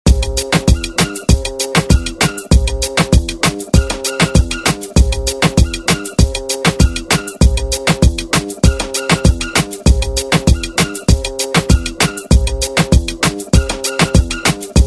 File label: Dancehall Redrum snippets; Deejay Hit